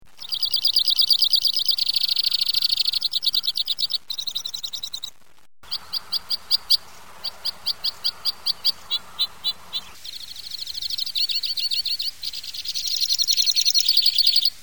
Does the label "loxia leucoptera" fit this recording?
Yes